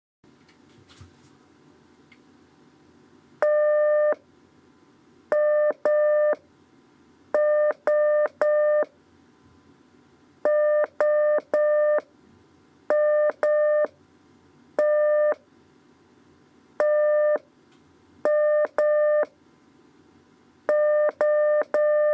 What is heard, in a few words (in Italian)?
LEZIONE CW, telegrafia; corso di telegrafia on air